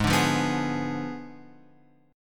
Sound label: G sharp Diminished 7th G sharp, Guitar Open F; G#dim7 strummed; JGuitar